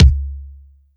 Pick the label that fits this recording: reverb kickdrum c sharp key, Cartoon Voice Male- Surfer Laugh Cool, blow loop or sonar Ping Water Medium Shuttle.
reverb kickdrum c sharp key